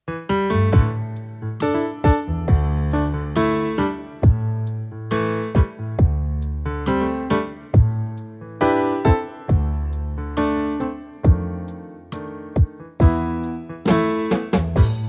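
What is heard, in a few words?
C funky, Backing Tracks for Male Vocals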